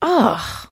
trader sigh; 七日杀; 商人杰恩

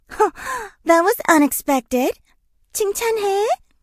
hurt vo, kr; melodie

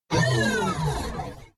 dismissive beeps; 堡垒（守望先锋2）; 炮台; 轻蔑的哔哔声

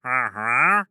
wandering trader trade; Minecraft

